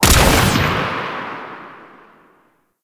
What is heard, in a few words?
Sniper Rifle Fire; Warframe; Veilbreaker; Venkra